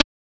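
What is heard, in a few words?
FX synth; Sound & Vision - Gigapack II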